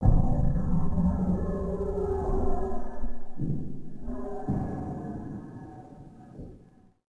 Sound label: Pound Growl; Ambient; Sid Meier's Civilization 4 Complete; Beyond the Sword